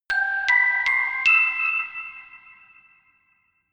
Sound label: notification glockenspiel creepy sequence ascend